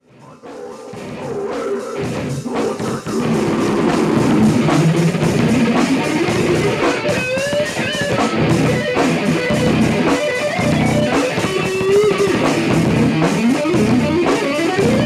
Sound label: death metal solo; lead guitarist